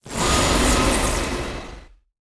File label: Boss Mech Gorilla, monster